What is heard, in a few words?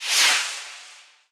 Fire Works Launch